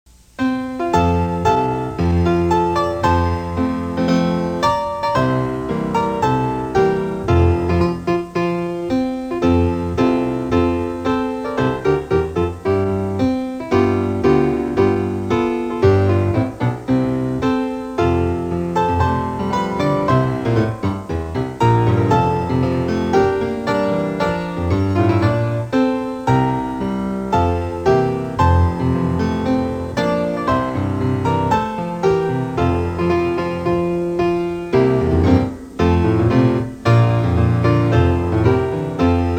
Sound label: piano